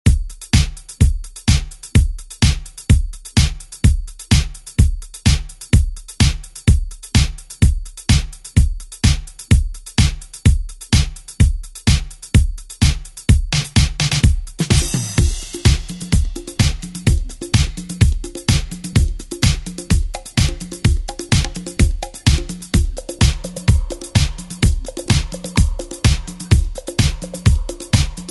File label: 70s Re Drum; DJ Mhark